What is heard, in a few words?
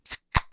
Can Opening